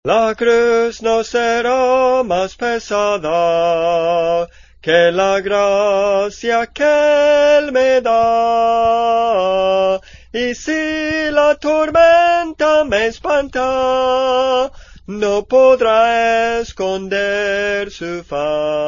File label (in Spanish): Soprano, Cantos Espirituales; Soprano (melodía)